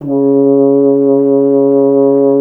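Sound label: BRS F.HRN, Solo Instruments, French Horn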